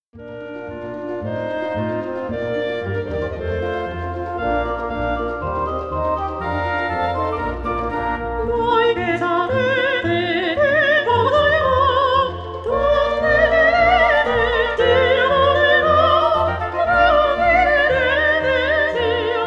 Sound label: opera; mozart